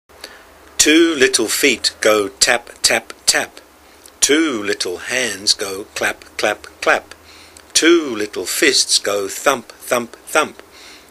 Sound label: Two Little Feet Go Tap, Tap, Tap